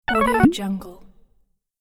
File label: cartoon fast steps down plop